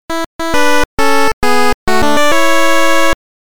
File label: 8bit